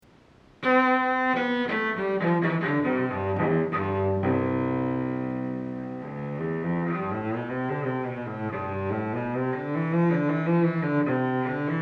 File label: cello